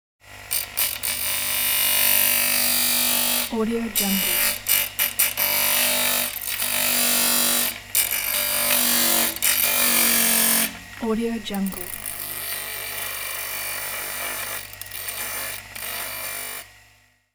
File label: metal grinding